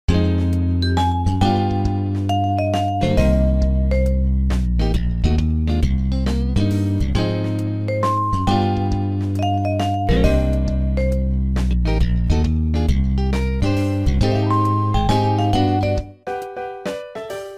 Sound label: bgm; KantOS